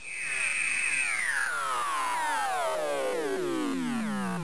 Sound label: WHIRR; Macworld (1996-07); frEQout demo; INTERVU